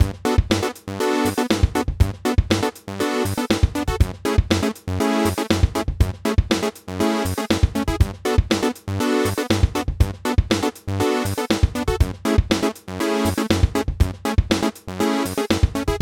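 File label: saw riff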